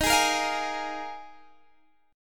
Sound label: D sharp Suspended 2nd Suspended 4th D sharp Guitar Standard; strummed; JGuitar